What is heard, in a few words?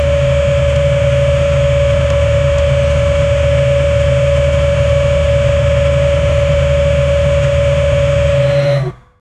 sci fi core reactor sound